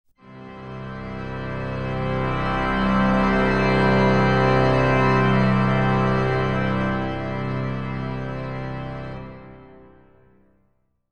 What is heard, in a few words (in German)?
schweller